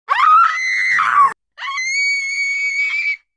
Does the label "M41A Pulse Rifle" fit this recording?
No